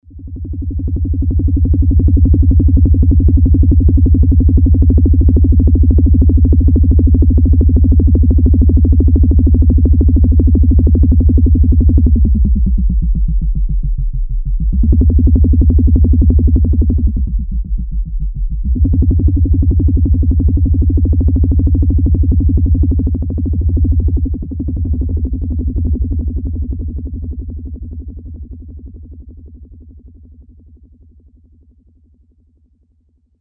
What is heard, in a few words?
Helicopter, Novation UltraNova; Factory Patches